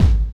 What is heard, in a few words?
Kick, drums; Sonic Foundry (Sony Creative Software); Drums & Drones; Construction Kits; Skip Kit